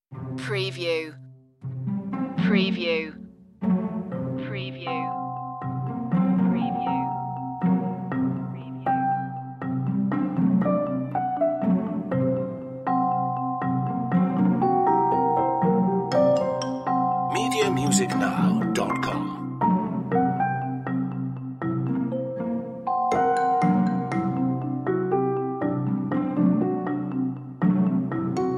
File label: no triangle or cymbals